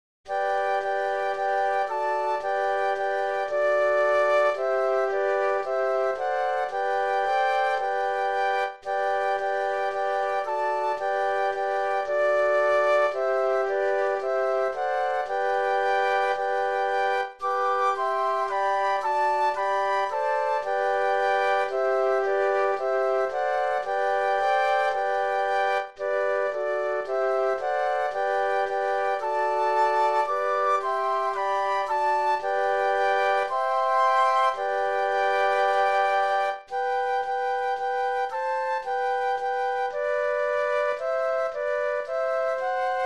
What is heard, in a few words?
Flute Quartet; christmas